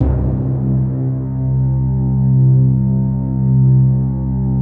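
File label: PAD, atmospheric pads; Best Service; XX Large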